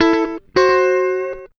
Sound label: Blues, Electric & Acoustic Guitar Loops